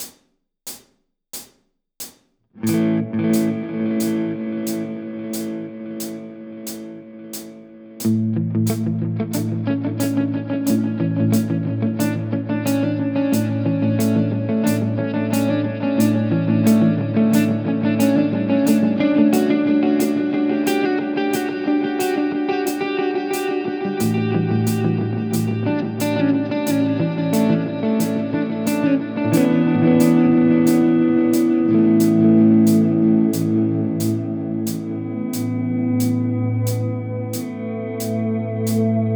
Rhythmen mit Delay 90 BPM, Raining 16th; Guitar Rig 7 Preset; Its Raining 16th

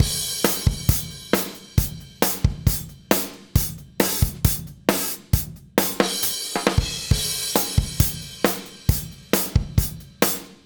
Kompressor Vergleich TBTECH Cenozoix

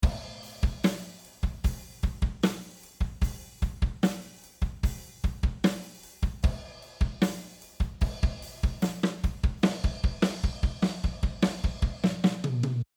Schlagzeug Neve1073

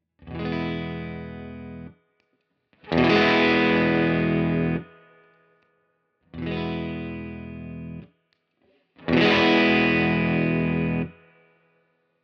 Tone Tweaker Boost 16 Uhr; Carl Martin

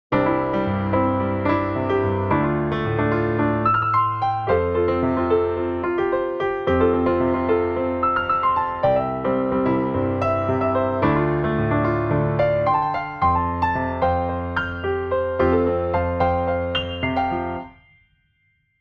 studio piano frei solistisch; logic pro 11 session keyboarder demo

